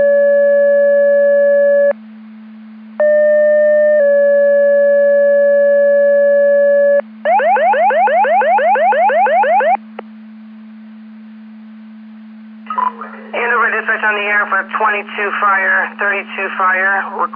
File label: Siren; Andover Twp Boro